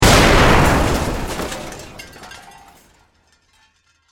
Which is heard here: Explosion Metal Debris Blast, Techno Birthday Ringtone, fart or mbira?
Explosion Metal Debris Blast